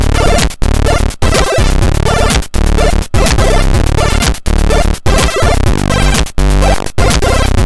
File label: Menu; Magical Drop